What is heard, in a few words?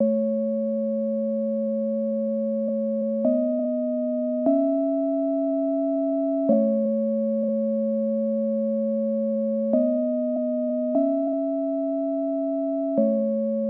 74 BPM C# Min Sine Synth; Melody Loops; Amber Eyes; Cymatics; ANALOG EVOLUTION Beta; Lofi Collection